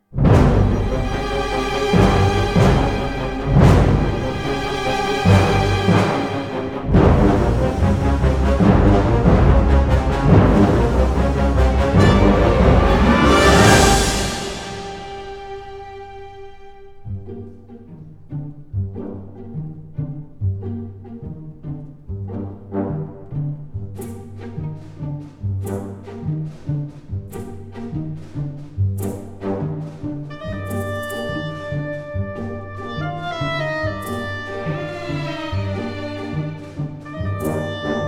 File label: Bossa; DOCTOR WHO